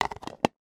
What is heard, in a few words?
tupperware box lid put on; foley